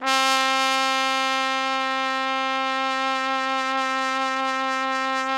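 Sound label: trumpet; Roland - LA Composer vol. 3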